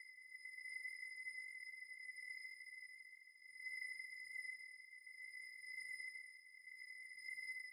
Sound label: dream orb loop